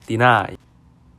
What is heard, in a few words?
deny